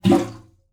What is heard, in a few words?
Liquid Water Movement Splash Bathtub